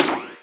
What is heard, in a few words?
Percussions